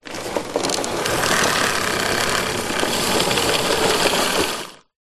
household vacuum cleaner roll wood floor power off; zapsplat